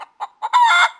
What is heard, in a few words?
cluck; Animal Sounds; Various Animals; Short calls